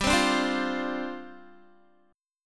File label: G 7th Suspended 4th G down Guitar